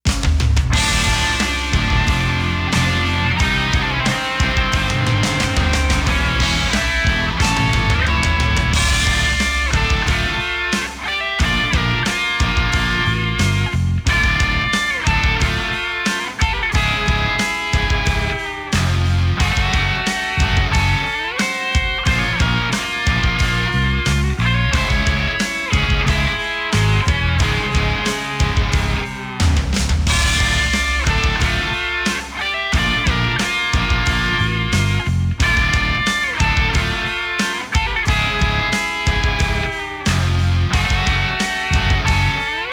Match Loss; Against - Donkey Kong; Mario Strikers: Battle League Football

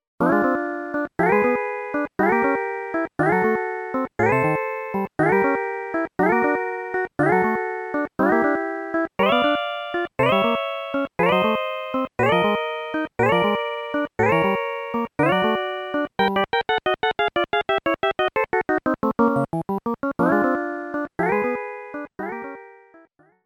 Fight; dragon quest game music super collection vol.1

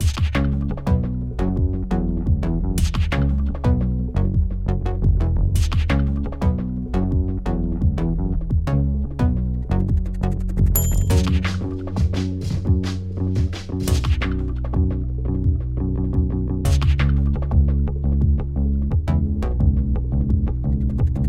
Breakbeat; minecraft story mode gamerip 2016; Assembly Required; Redstonia Credits

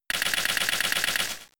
Bullet Seed 1hit; Pokemon; Gen 3; attack moves; RSE

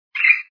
Birdy; Super Mario 63

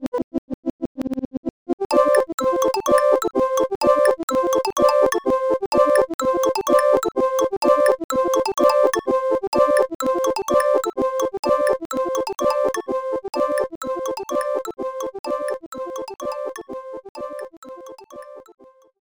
Goal

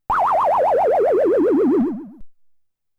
SFX Miss; Donkey Kong Jr.; arcade

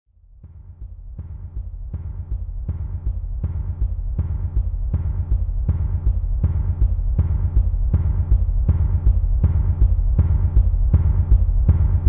Heavy Drums; Five Nights at Wario's 1

